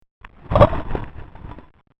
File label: touchdown